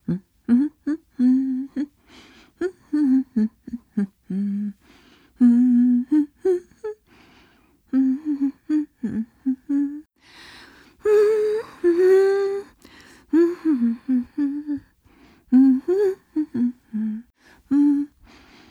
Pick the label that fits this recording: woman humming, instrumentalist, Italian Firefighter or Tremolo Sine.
woman humming